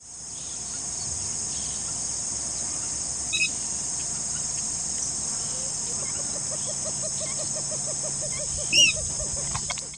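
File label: Porphyrio martinicus, bird; گالینول بنفش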